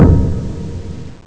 mega pauke; Amiga Soundtracker Sample Packs

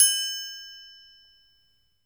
TRIANGL; Sonic Reality; Interactive Drum Kits

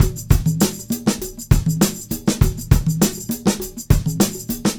Combo, R&B Drums

